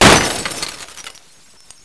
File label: window crack